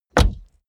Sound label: car bmw e46 exterior door rear close; Cars